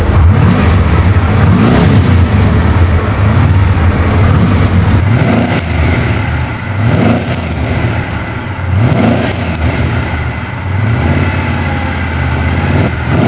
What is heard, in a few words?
in and out, v8 mini